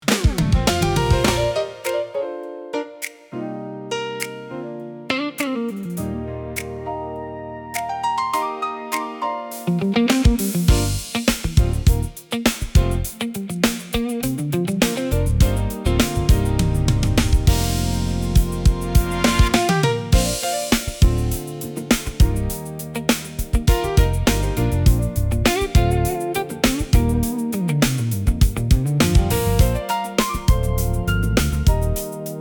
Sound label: corporate background music; موزیک پس زمینه تیزر تبلیغاتی ایرانی